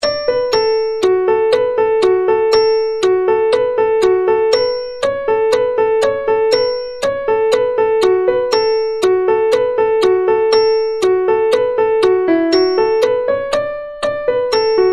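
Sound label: Concertina Reel, Traditional Irish Music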